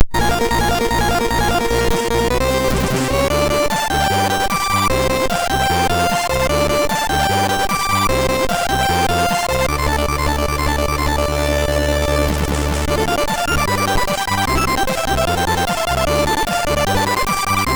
sid, MOS6581R2; Haircut

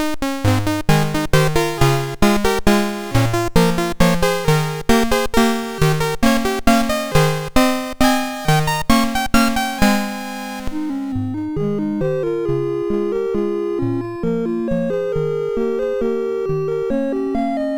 sid, MOS6581R2; Color Scrabble

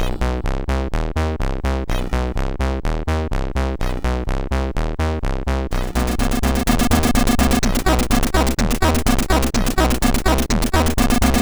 Techno Rap, MOS6581R3

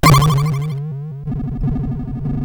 Die Alien Slime, CSG8580R5

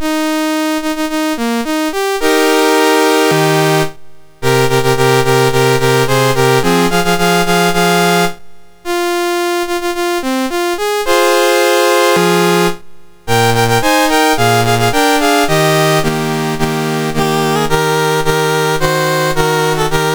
Colombia National Anthems, MOS6581R2

